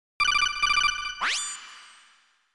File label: codec; metal gear